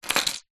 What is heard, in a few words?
vehicles keys car set down kitchen worktop